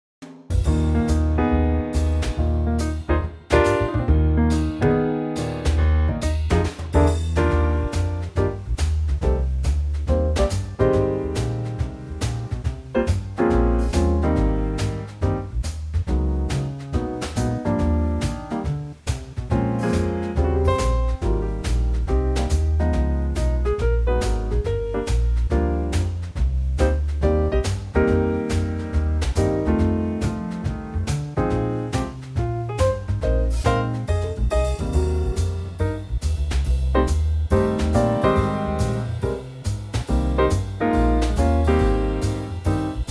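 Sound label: Eb trio, Backing Tracks for Male Vocals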